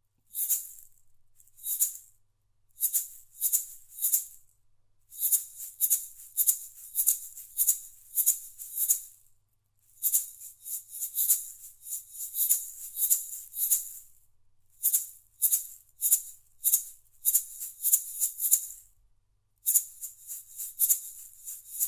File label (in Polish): Marakasy; NINO